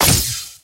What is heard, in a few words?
hit